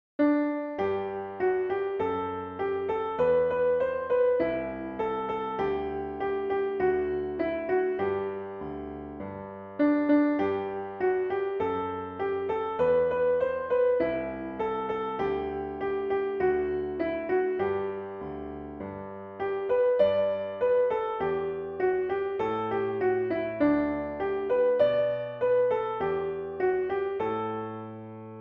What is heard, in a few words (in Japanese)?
ピアノ